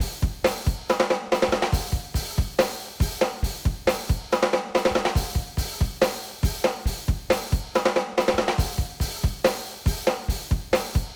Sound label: rock